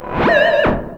DANCE FX

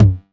909 TOMS

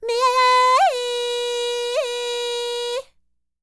TURKISH, Vocal World Voices NEAR EAST; ILIO; Vocal Planet VOL-5

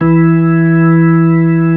B-3, Keyboards

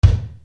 RX7 HEAV BD1, Yamaha RX-7

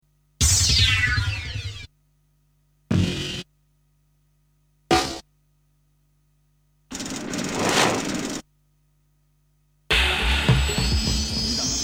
Sound Effects; Kniteforce; 1000 Samples Volume 1

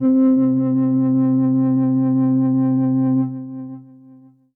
Synth C, Synth Prophet One Shots; Electronisounds

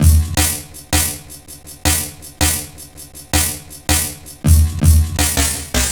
Stretcher 162, Drum Loops, Jungle

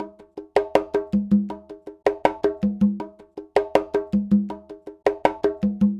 Conga, Latin Percussion

